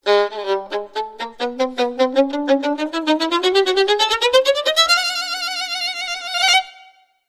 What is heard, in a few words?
Violin Creeping Up